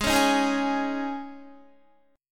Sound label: Ab Suspended 4th, Guitar; strummed; Absus4